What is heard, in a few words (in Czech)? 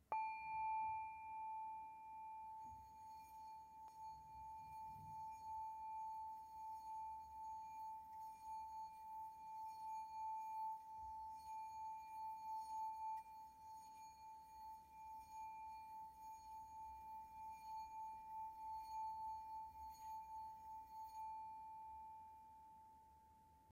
tibetska misa zdobena